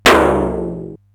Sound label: SDS9 RM, Simmons SDS-9, Rimshots